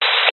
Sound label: technology cb radio static burst